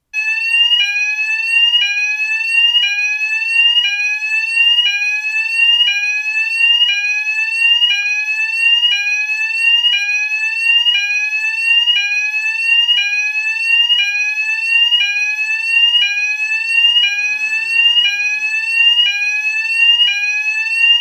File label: smoke alarm